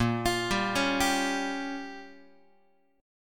A sharp Minor 6th A sharp, Guitar 4A,4E,5A,5D,5G sharp,6C sharp; x,6,8,5,5,6; arpeggiated; JGuitar